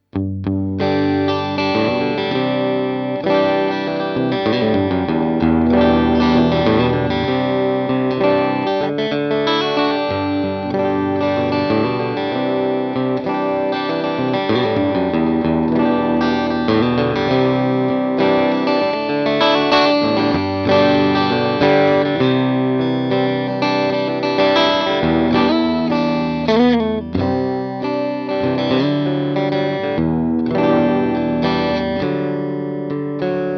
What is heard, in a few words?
clean